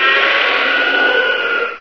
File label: die; hl1; controller; Source engine